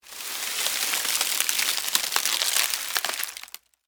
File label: Foliage Crunch, Movement, Palm, Frond; Texture; Rustling; BlastWave